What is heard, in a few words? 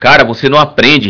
berimbau